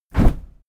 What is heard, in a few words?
cardboardbox soft